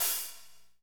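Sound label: HAT hi-hat, Pop Country Dry; Northstar; Drumscapes Roland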